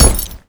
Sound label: foley impact